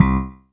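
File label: RX5 electric bass L; Crypton - DrumBase5000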